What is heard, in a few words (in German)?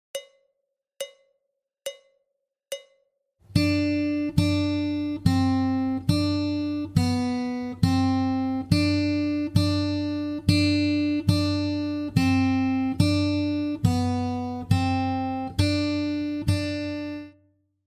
Warm Up D hoch, gitarre